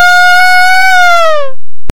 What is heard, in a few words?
calm